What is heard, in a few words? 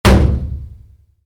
Wood hit metal heavy, Wood, Impact: Metal, Heavy; Materials; Stuart Duffield